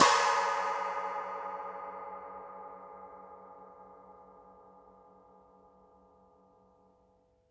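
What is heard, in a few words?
Brushed China Cymbal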